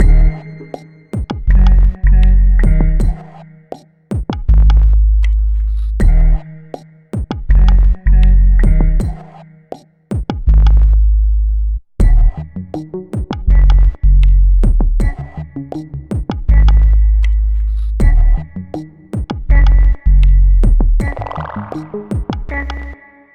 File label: stem outro; Pix the Cat